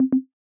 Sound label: notification, ringtones